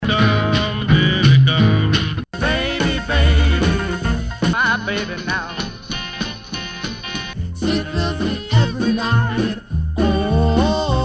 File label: Baby